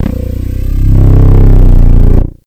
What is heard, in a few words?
pain